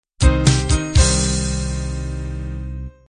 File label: bubble gum end